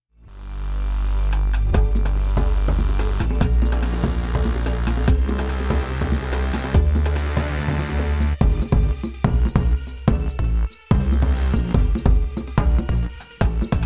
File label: Percu Bass; XantaFe